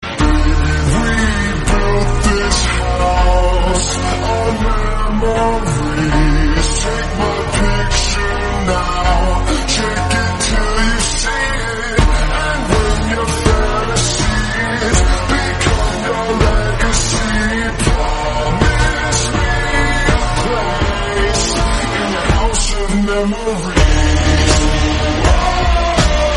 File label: piano, english song